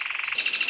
Cichlocolaptes mazarbarnetti rattle x Philydor novaesi song